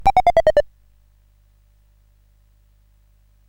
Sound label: CSG8580R5, GAMES; Sammy Lightfoot